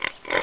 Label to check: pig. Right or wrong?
Right